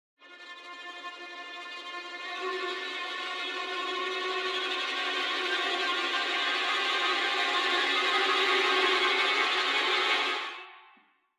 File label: Horror ambience tension build up suspense